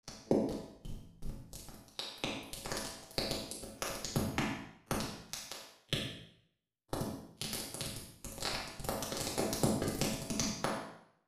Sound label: Medium Room; reverbs; renaissance